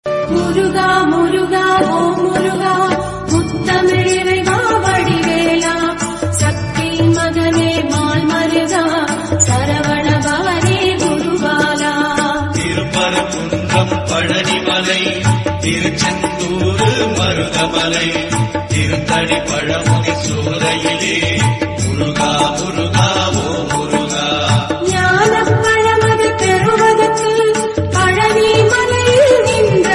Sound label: God Murugan Tamil ringtone; ringtones